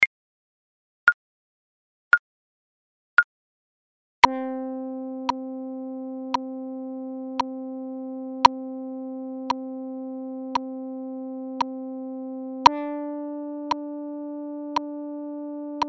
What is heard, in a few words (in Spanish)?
Respiracion 1 57bpm